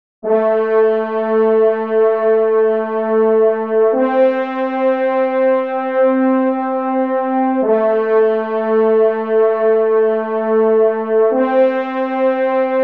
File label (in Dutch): Hoorn in Eb